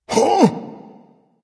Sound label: confused dragon; fafnir